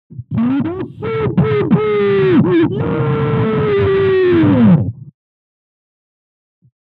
bass boosted; superbowll

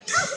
child startled

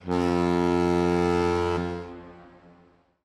alarm clock

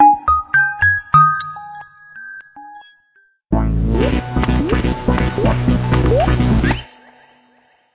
startup sound; didj

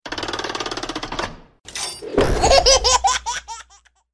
baby launch

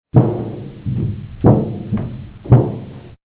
vent crawl; fnaf 2

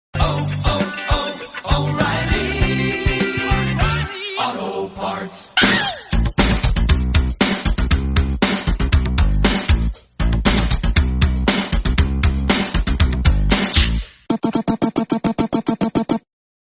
owwwww

